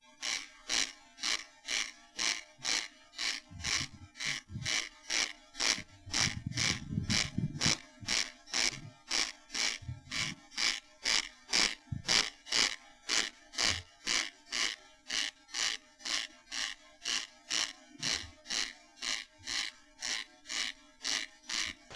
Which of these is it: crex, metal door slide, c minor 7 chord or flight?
crex